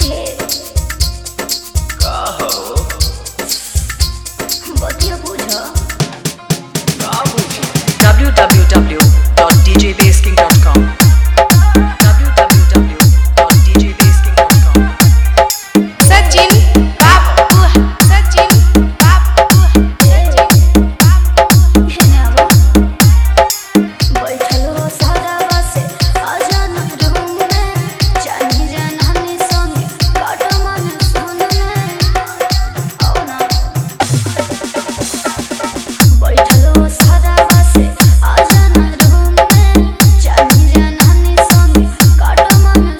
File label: Hard Vibration Mix